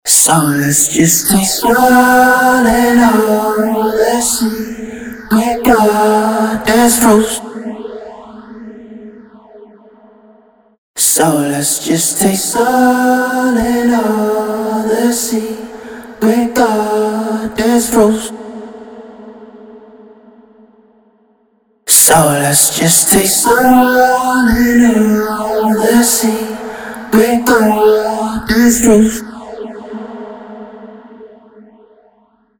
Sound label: Instant Phaser Mk II Vocals; Eventide